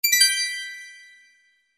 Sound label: im new message